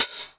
Hhat, Hihat; Equipped Music; Loungin House

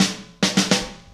Beat Intro, Indie Rock Anthems; Sonic Foundry (Sony Creative Software)

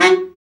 BRA BARI, Bariton Sax

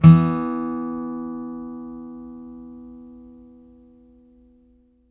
5ths E3, Blues Guitar; ILIO; Fretworks

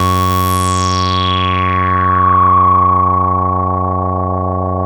MOOG, BASSES; Masterbits - Vintage Voltage; VV BASSES

